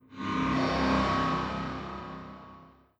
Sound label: wet, strider, combat, movement grunt; Half Life Alyx